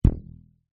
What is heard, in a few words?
elastic ribbon twang; foley